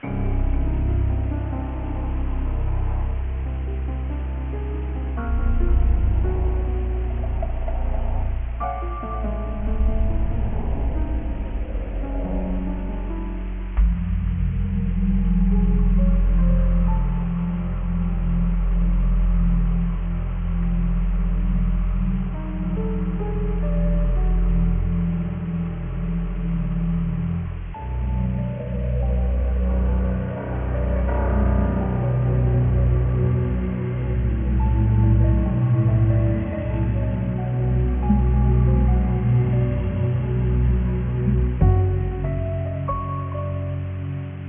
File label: castle theme; hundershade strengthen